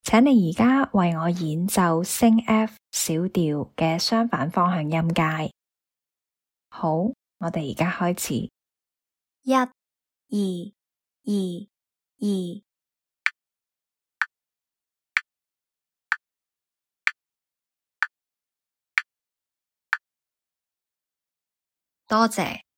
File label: f sharp min cont motion scale